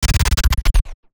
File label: Amp Stutter Glitch vari fi slow down; GlitchBlog